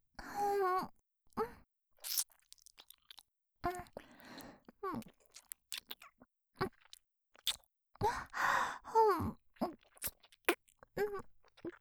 ディープキス 臨場集中

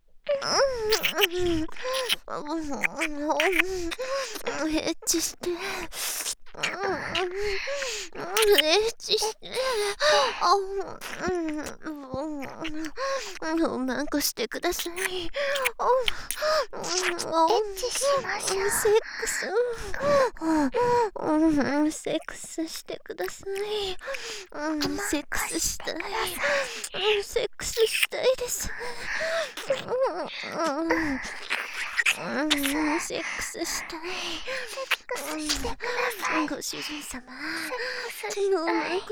耳舐め喘ぎ; ハーレムオナサポ 4人のメイドがご主人様のオナニーをお手伝いするバイノーラル～文、千曜、名月、莉桜～; エッチしよ

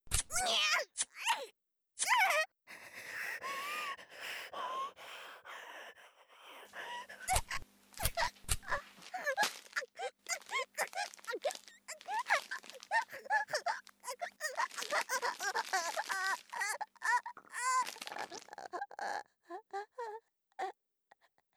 陰部を刺す

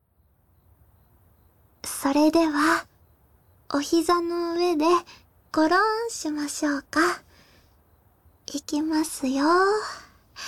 膝枕で耳かき(左耳); ねこぐらし。〜ミケ猫少女と鈴のオト〜; 上坂すみれ; ねこじゃらし

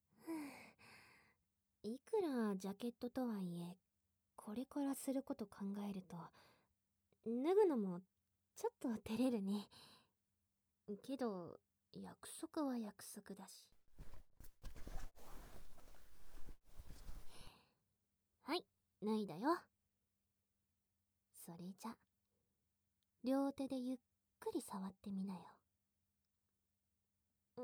乳揉み&耳舐め; 遥

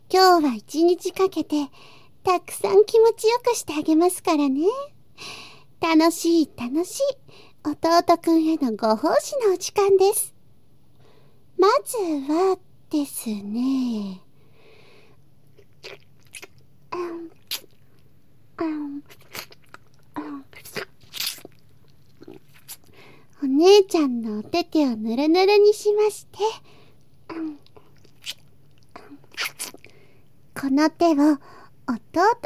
あまあま囁きねっとり耳舐め（バイノーラル編集 効果音あり）; みみあね。 ～世界で1番弟クンを甘やかすお姉ちゃん～【囁き・添い寝・立体音響】

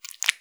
深めにストローク, ピストン運動 激しめ; １ストローク単発

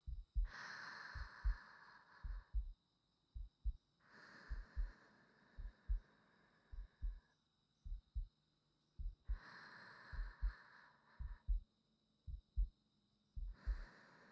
寝息と新妻の鼓動(安眠ループ用)

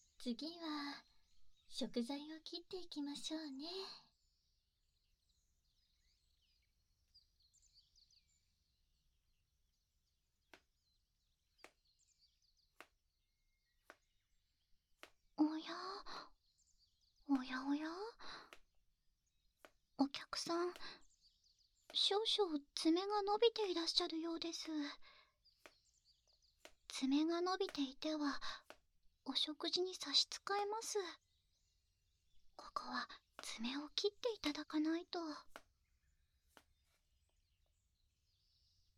爪を切る、ヤスリ＆食材カット; 注文の多い耳かき店

